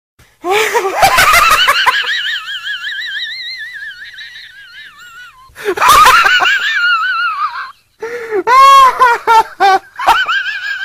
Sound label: Sms Tone